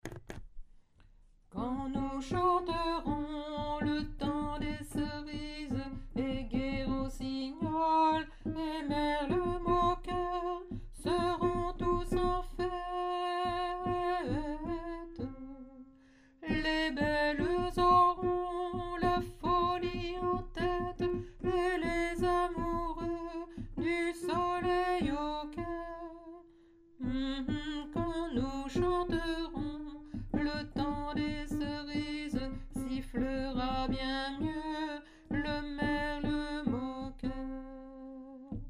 alto, chorale; le temps des cerises

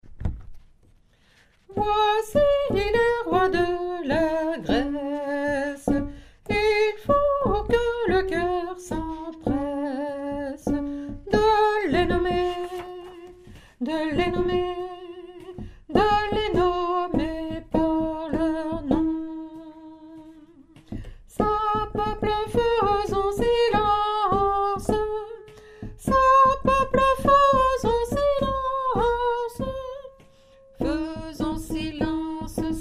alto

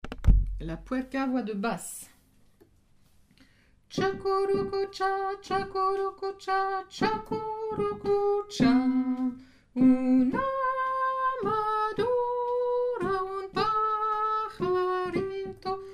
la puerca basse

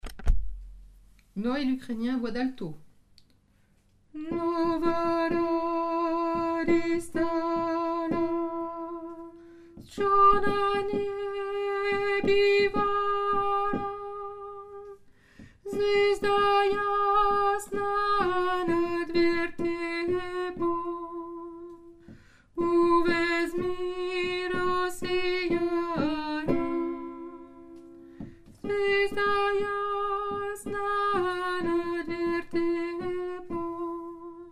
noel ukrainien alto

